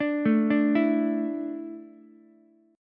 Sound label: A Suspended 4th A down Ukulele; Asus4 arpeggiated; JGuitar